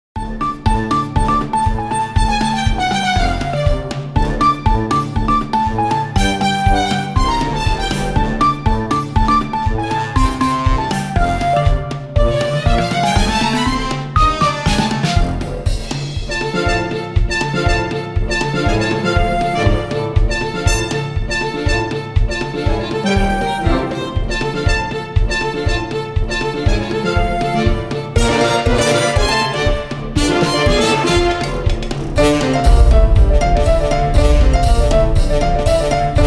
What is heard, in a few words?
Jig